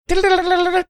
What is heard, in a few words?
making cartoon running on barrel sound